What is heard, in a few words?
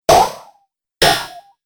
Game Metal Spring Jumps; Video Game Sound Effects